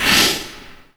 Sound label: plasma; endless sky